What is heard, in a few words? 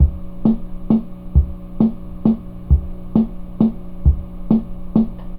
Thomas Organ Waltz